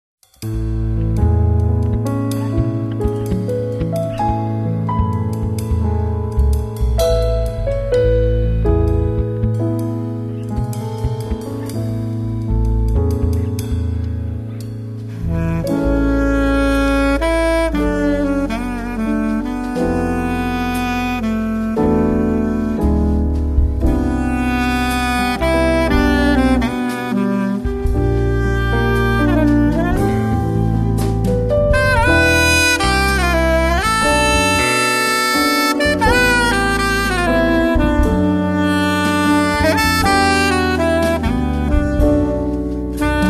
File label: Jazz Atmosphere